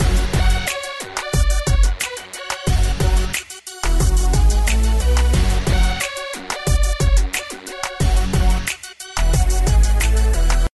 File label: Hip Hop Ride Loop